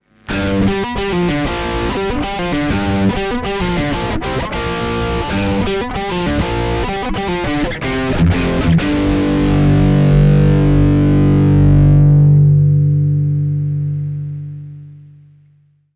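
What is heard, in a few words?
guitar di rock, behringer vtone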